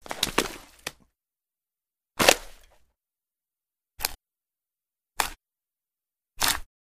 Flesh Rips, gore stabs & eat

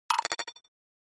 Flash Gear Multi Elec

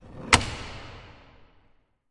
Bolts Var Reverb, latches

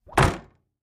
Attic Closet Door Clo

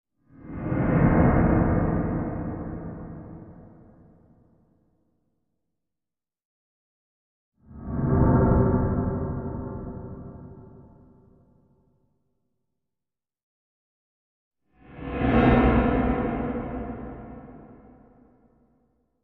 Music Eerie Tonal Me, pads

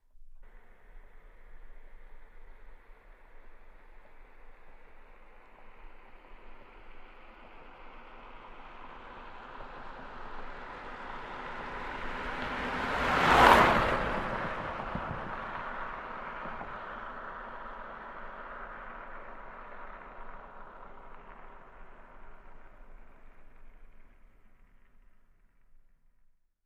Car Tires Snow Studd; tires on snow

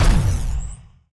SHOCK RIFLE COMBO EXPLO; rifles

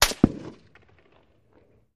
Gun Shot Sngl Left

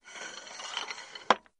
Tripod Leg Telescop

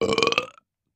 Belch Regular; belching

